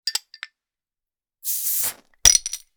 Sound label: Crown cork Open the bottle